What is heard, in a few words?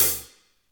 HAT; E-MU Formula 4000 Series Vol. 1; Hip Hop Nation; Bonus Perk